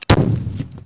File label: mortar fire; weapons; hl2